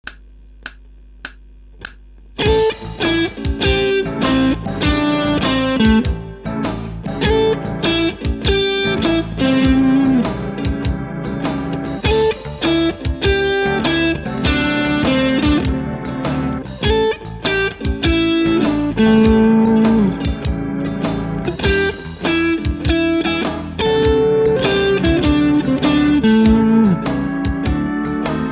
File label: Blues Etude